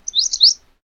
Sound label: town morning